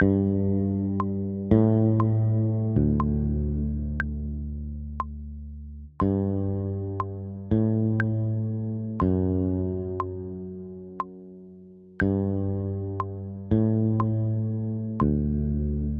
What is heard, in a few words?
simple bass